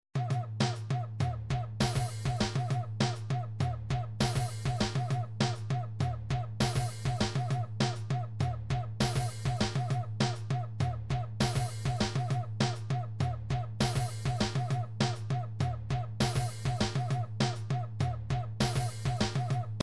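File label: drums pattern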